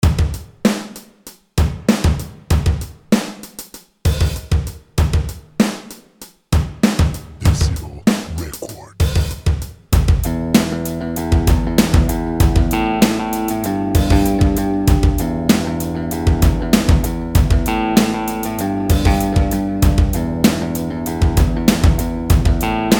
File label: Rock